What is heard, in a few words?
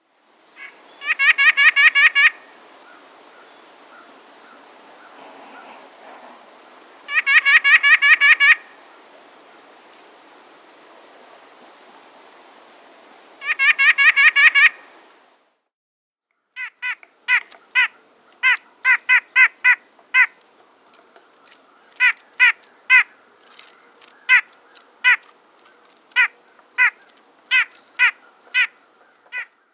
White-breasted Nuthatch (Eastern)